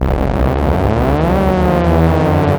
BAS, Eurotech; Sounds Good

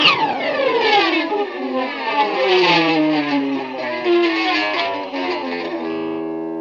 GTR, Dirty Efx; Club-50 - Foundations Roland

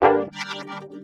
Guitar Chords G; Zero-G - Future Wave

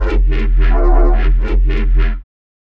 Bass loops; neuro; Optiv & BTK

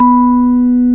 B3, Vintage Timetraveler; Sonic Reality; HAMDIRECT

